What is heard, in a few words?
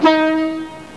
klaxon, Train; X4630 TER-886622